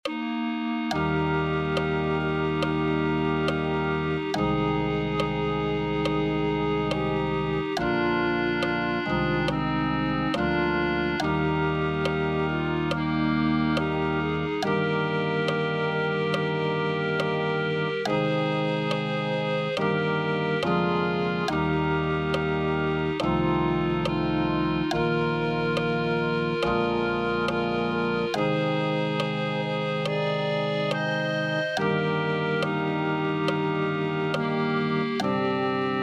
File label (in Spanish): clarinete1